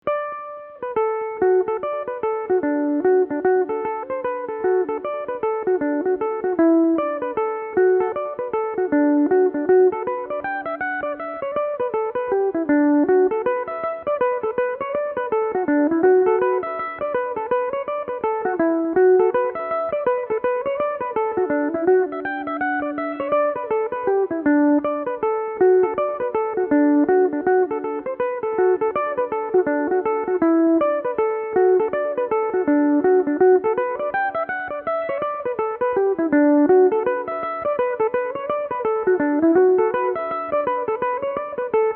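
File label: D major belmuse, irish mandolin; miss looneys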